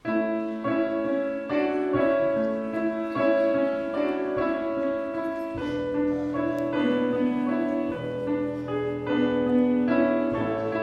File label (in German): Vorspiel